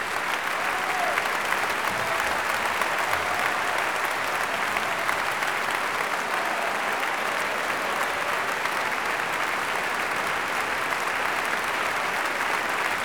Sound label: Closing applause; Concerto for Violin and Orchestra in D major, op. 35; Tchaikovsky